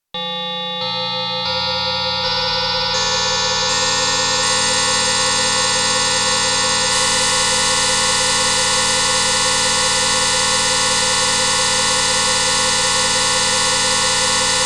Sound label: REPRO-5 Chime Time HQ ON OFF ON